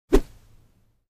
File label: Mid High, whoosh